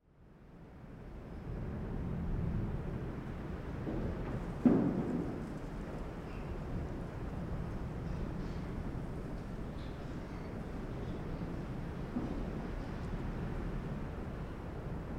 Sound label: Ruins room ambience winds; John Grzinich